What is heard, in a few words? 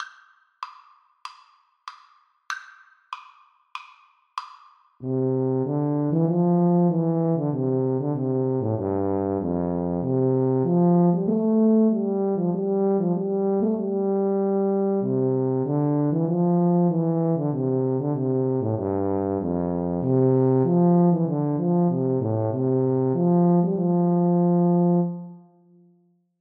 Tuba Duet